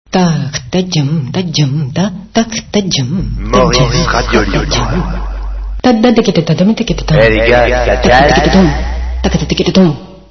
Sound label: jingle